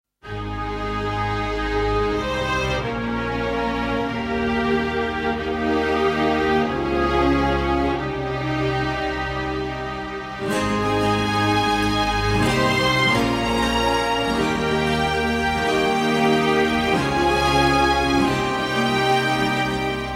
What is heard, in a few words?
classical